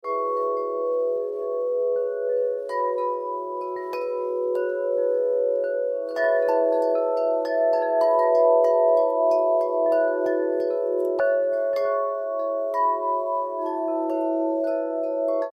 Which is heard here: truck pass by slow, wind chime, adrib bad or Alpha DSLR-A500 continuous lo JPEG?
wind chime